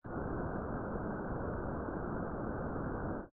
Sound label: thrust; Destroy-Debris